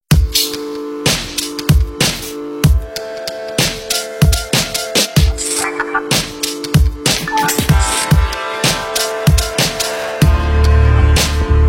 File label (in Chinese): 鼓组; Battery4; Komplete